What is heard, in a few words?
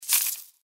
mantis hiss; npc